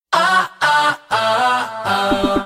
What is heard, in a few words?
oh oh oh